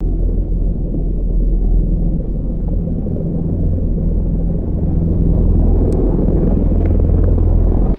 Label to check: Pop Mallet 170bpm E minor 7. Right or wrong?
Wrong